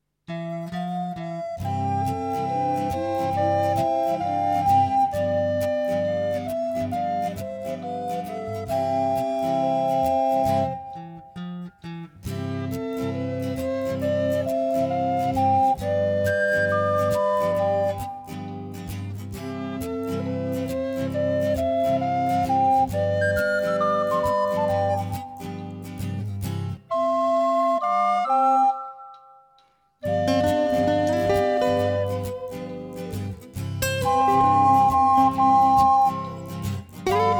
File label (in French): Joueur De Flute Accompagnement